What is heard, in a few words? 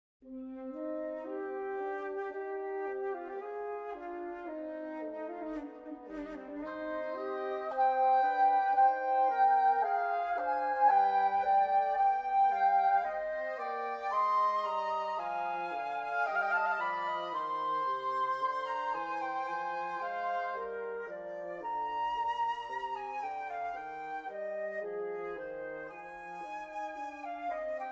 TRIO